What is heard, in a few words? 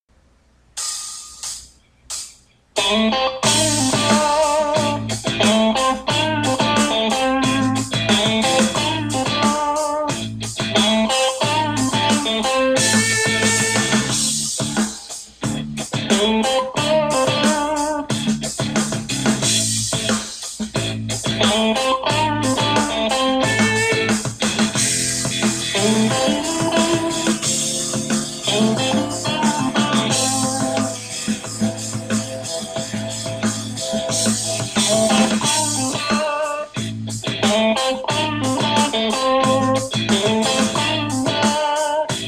killer blues vibrato